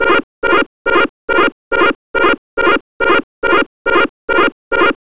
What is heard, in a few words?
heavy damage alarm